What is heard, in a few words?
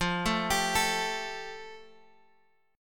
F Major Add 9th, Guitar Open G